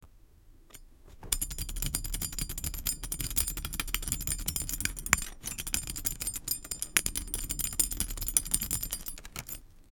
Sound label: smooth fast keyboard typi, typing